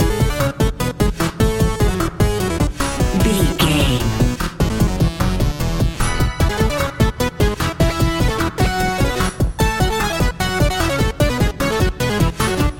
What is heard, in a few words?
hero theme video game loop